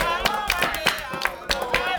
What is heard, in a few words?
120 CHANT